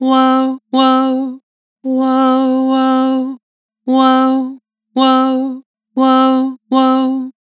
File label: Vibrato "Woah", Vocals; Harmor Vocals